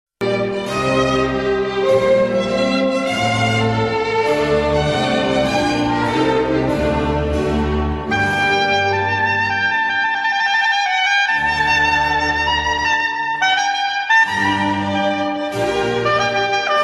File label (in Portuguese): oboé